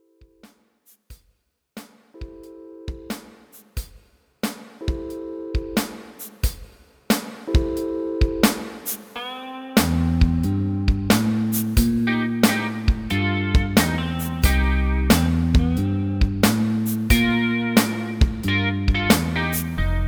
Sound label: MIDI blues